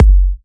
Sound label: F# kicks; markus hakala kicks